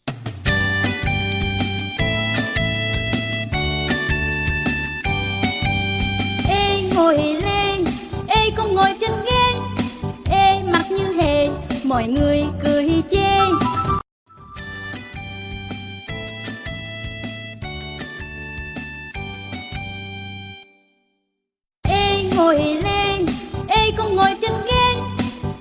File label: music